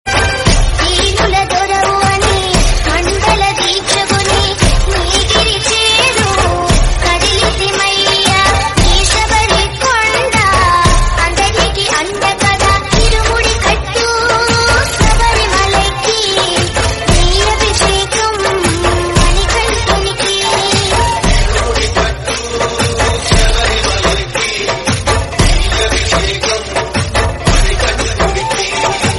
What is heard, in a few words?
Ringtone Tamil; Ayyappan